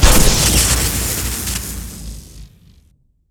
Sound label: single overcharged; skibidi toilet glitch; Cameraman RANGED